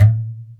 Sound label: marimba, Percussion Ticks; Misc Percussion